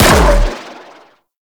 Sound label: Briefcase Akimbo Pistol Shot; Warframe; Corpus